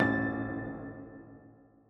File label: Tap